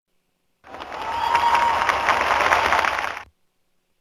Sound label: message sent, phonetones MsgTone; LG; U8500